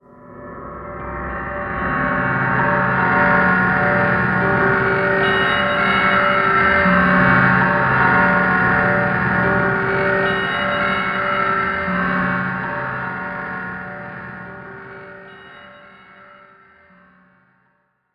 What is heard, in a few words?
Yamaha TG500 Fear